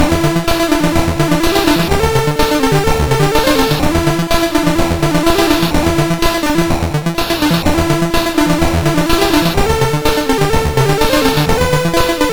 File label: Synth Sample III, CSG8580R5